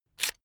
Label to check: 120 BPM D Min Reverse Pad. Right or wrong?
Wrong